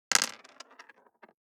DICE on Plastic, Throw and Roll, Standard, 3 Three Dice; Samples; ArticulatedSounds; DICE Sfx Party (Extended)